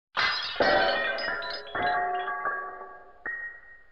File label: break glass